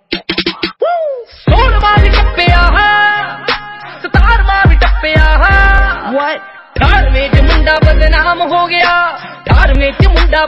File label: ringtone